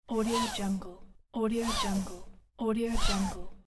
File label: seatbelt pull